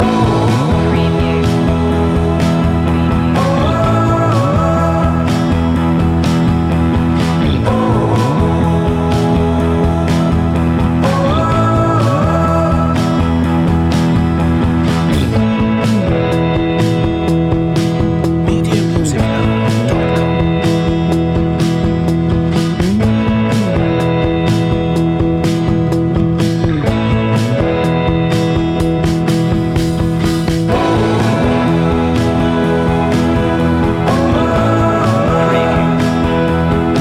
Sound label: rock with us